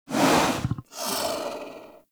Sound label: Snow Critter Vocal Breathe; Hunting Critters